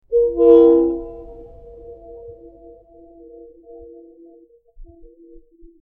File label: DX Horn, Railways